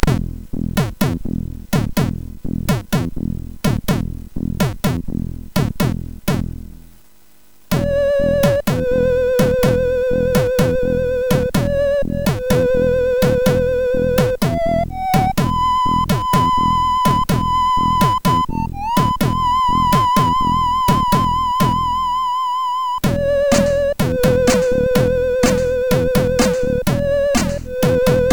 end, MOS6581R4

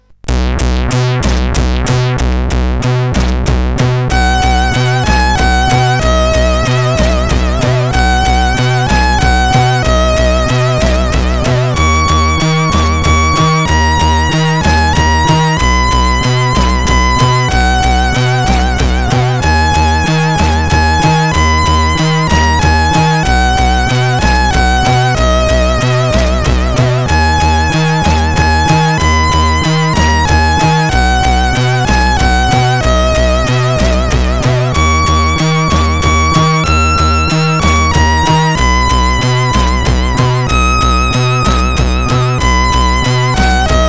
Christmas tune, CSG8580R5

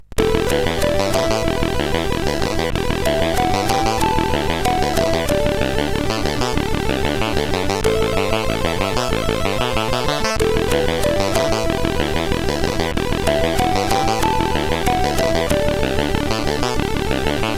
Jingle, CSG8580R5

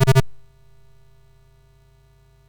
Chopper, MOS6581R4

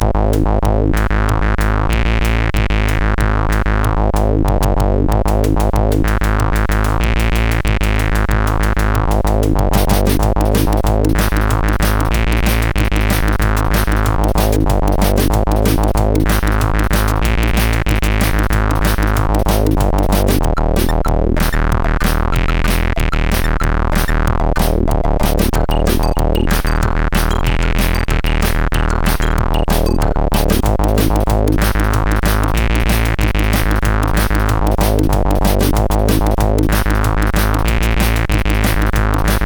Hard Tekkno, CSG8580R5; HVSC

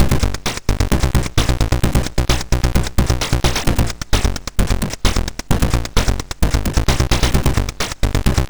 Dance Sample, MOS6581R2

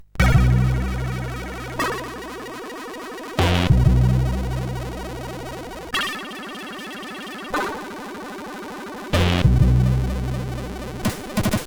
Hardcore, sid CSG8580R5

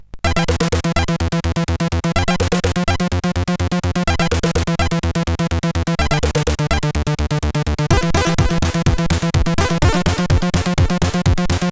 Techno, sid CSG8580R5

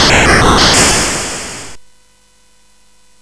CSG8580R5, GAMES; Street Rod

